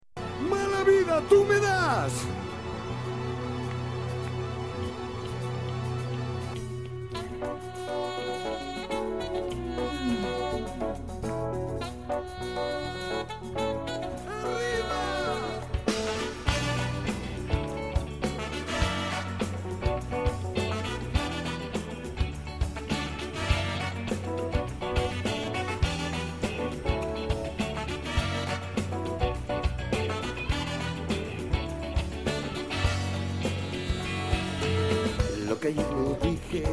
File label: Concert